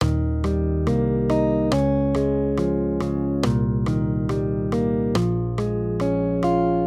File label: maj triads